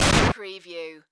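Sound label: Production Element Distortion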